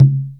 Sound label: INDO DRUM; E-MU Formula 4000 Series Vol. 2